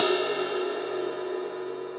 RIDE 22 edge tip; RIDE PE TW 22 edge tip; Ableton; Factory Packs; Drum Booth

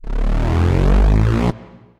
air raid riser; Effects; Ableton; Factory Packs; Beat Tools

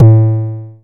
analog tonal, Kickbank

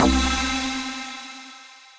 Synth Lead; Mars Attacks

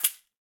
Caxixi Hand; Latin Percussion; Drums; Drum Hits; Shaker; Ableton; Factory Packs

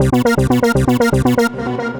Raw Creep, synth rhythmic; Ableton; Factory Packs; Punch and Tilt

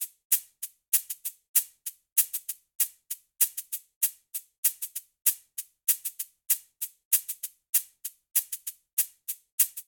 Pop Cabasa 97bpm, Latin Percussion; Ableton; Factory Packs

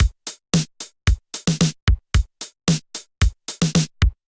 707 Kit groove 112 bpm, Drum; MIDI Clips; 111 - 130 bpm; 707 Rok With-U Kit groove; Ableton; Factory Packs; Drum Essentials

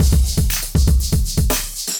Acoustified Kit, Drums

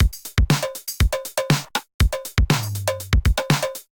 707 Kit groove 120 bpm; 707 Funky Beans Kit groove 04 120 bpm; Ableton; Factory Packs; Drum Essentials

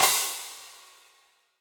Crash FX 17 Session Dry, Cymbal; Drum Hits; Standard Hits; Session Drums Studio

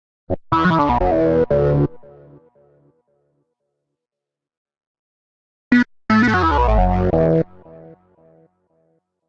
Lead B Minor 86bpm; MIDI Clips; Melodic; Buck Jones; Ableton; Factory Packs; Chop and Swing

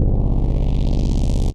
Bass, Wavetable; Prologue Bass

